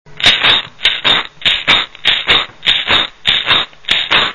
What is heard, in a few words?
MARACAS